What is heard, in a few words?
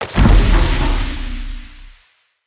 Metal Crate Destroy